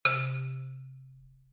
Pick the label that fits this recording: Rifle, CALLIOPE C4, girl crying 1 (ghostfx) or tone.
tone